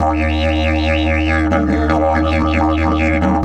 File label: EF 140, DIDGE RIFFS